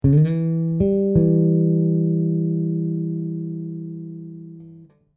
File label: E to G m3, bass; chords and double stops on bass